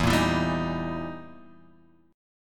E Minor Major 7th Flat 5th, E, down

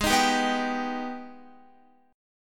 Ab Minor Major 7th, Guitar; strummed; 4E,4A,5D,5G,5C,6F; x,11,13,12,11,14; JGuitar

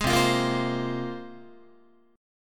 C 7th Suspended 4th Sharp 5th, Guitar; C7sus4#5 strummed

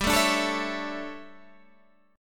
D Major 7th Suspended 2nd Suspended 4th

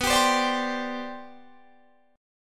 C Major 7th Suspended 2nd B, chord

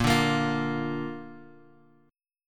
Bb Major, Guitar, Open F; 10,9,10,12,x,x; Bb strummed; JGuitar

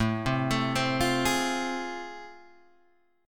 A Minor Major 7th Sharp 5th, Guitar Open G

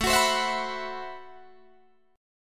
A Minor 6th Add 9th, Guitar, Drop D

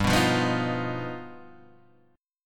G Augmented 7th; G+7 Chord {3 2 3 4 4 1} strummed

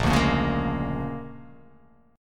A sharp 7th Sharp 9th

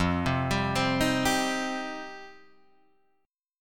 F 7th Suspended 2nd F down Guitar Drop D

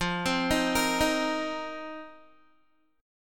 F 7th Suspended 4th F down Guitar; arpeggiated

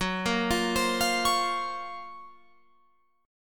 F sharp Major Sharp 11th, Guitar, Open F; F#M#11 Chord; arpeggiated; 18,17,17,19,20,20

